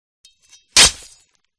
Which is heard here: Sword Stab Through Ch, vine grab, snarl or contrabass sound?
Sword Stab Through Ch